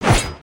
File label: attack, creatures mechanical